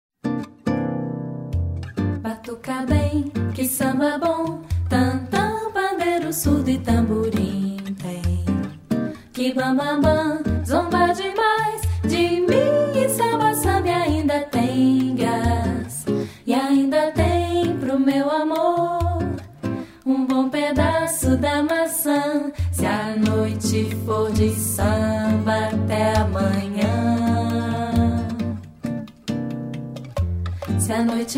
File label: samba